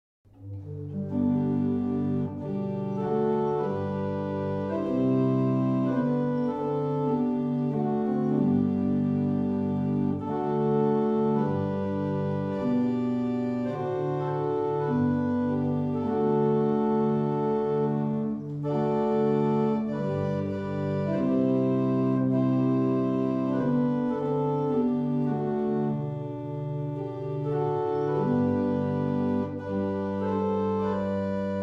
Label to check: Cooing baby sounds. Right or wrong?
Wrong